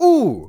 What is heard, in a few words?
ooh; HeavenStudio; dj School